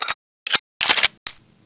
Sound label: m4a1 silencer off